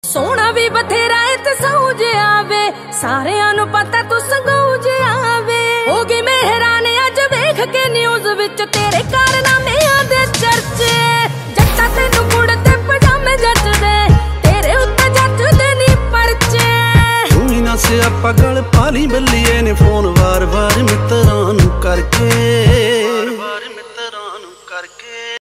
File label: DJ Punjabi Ringtone